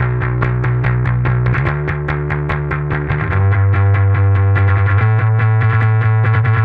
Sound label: Bass